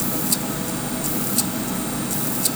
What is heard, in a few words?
gpg mic